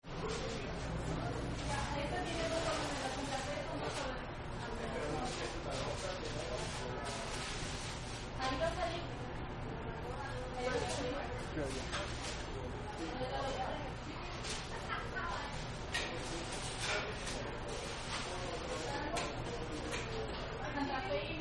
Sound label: Fastfood Restaurant, Ambience Recording Of People Ordering Food At Fast Food Restaurant; Various People Ordering Food And Talking In Spanish; People Sound Effects